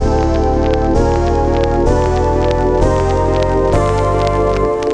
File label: Scratchy Melody, cinematic funk; Sonic Foundry (Sony Creative Software); Cinematic Funk Urban Tone Poems